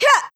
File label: combo, onehand sword; sura